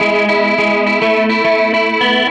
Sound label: Gretsch Guitar Loop